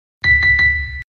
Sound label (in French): Sonnerie DUB